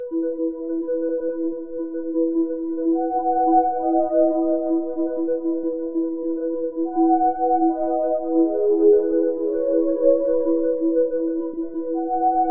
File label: main menu music; didj